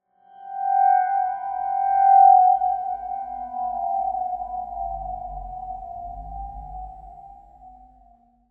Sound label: Tone Mysterious Circular Ringing - Beginning; Hollywood Edge; sound designer's toolkit v2